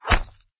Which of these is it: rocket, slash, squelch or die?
slash